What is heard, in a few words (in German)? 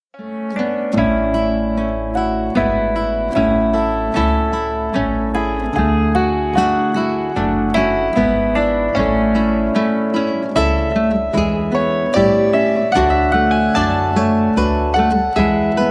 Menuett